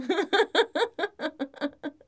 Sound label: female giggle; Gendered social sounds; CosmicRage; CosmicRageSounds